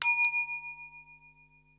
tibetan bell, Yamaha Motif ES drum machine; 300 Drum Machines; Yamaha Motif ES-Series

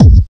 125 DRM, Fresh Disco House; USB Soundscan vol.20; AKAI

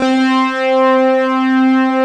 LEAD, Synthsonic; Pro-Rec - Synthsonic; BASSES

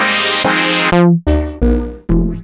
Synth Sounds

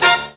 HIT Band, Big Band Hits

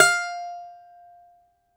DOMRA, Prima Domra, Plucked Strings

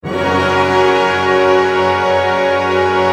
ORC PENT, Chord Cluster, Pentatonic; Roland L-CD702

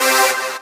Synths Root C

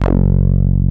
TB 303

